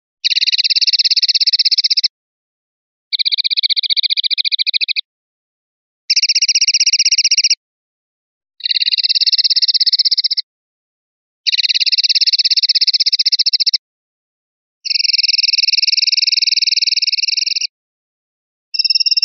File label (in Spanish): final carretillas; pardillo